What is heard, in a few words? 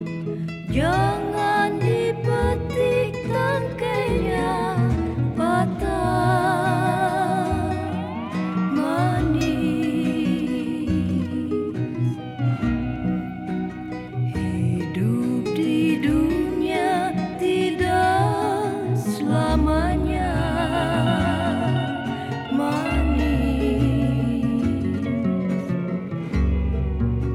Krontjong